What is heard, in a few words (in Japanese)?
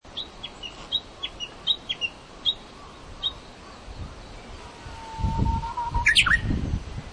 uguis